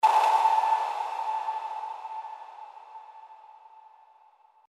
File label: sonar ambience low